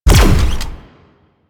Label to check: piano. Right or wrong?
Wrong